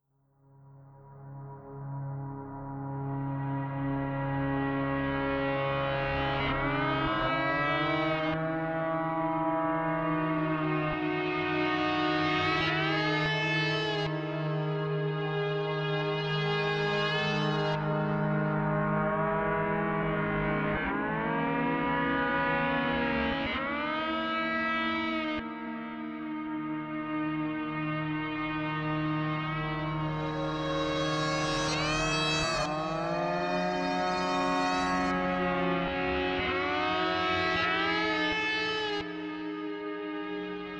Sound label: flute stories, 432 Hz; katharsis